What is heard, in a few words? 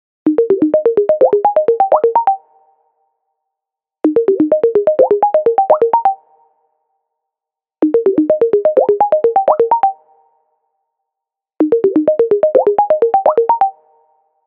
bubbles loud; MicrosoftTeams